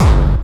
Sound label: Bassdrums Hard Kicks; Vengeance Samples